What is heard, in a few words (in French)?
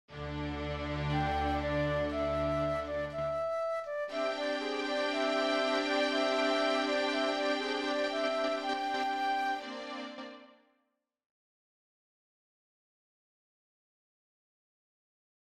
flute